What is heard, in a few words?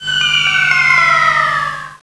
Flagship Falling; Swoop 1.0.0